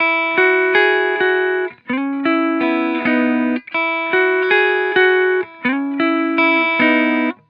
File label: Vibrations Chill Guitar Loop 128 BPM E Maj; Cymatics; Vibrations Guitar Sample Pack